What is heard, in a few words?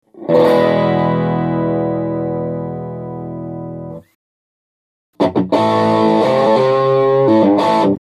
Amp CLEAN and DRIVE